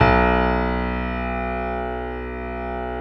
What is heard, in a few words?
yamaha grand piano